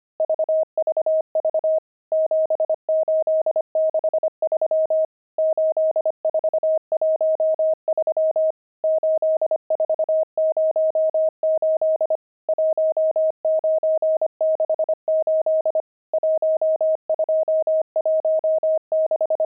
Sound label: pangram, intermediate pangrams files